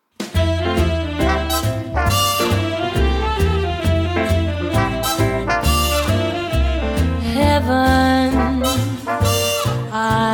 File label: Vintage Swing Vibe